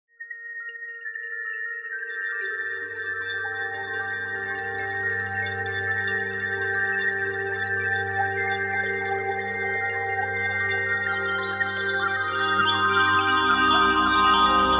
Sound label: aquazoo, fm7 sounds